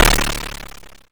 Snare, YM, CHIPSHOP HITS DRUMS; ChipShop Deluxe

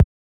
Kick, techno drum

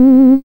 SID lead C; ChipShop Deluxe; ChipShop Hits

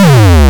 YM Bass F#m; ChipShop Deluxe; ChipShop Hits

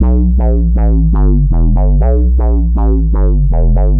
Tech Bass 120 E, techno; musicradar; 120bpm; Bassline

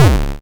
YM Kick, DRUMS

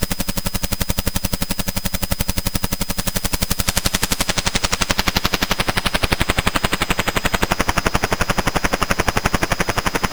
YM Heli; SFX; ChipShop Deluxe; ChipShop Hits

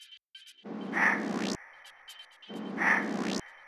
130BPM Atmos, techno; drum fx; Beats

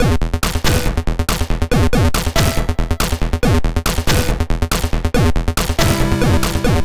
Combo Em, 140 BPM; CHIPSHOP DELUXE; CHIPSHOP LOOPS

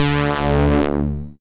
SID Synth D; SYNTHS; CHIPSHOP DELUXE; CHIPSHOP HITS

